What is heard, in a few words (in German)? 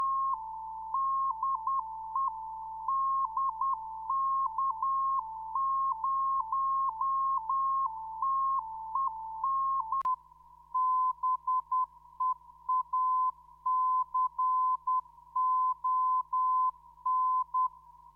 Amateurfunk Bake CW; DK0TEN; 28257khz; V2D-S3